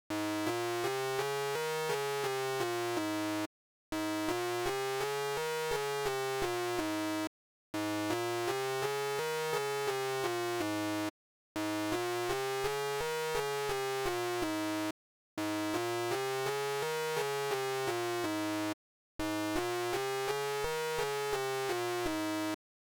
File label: Prophet-5V vs Repro-5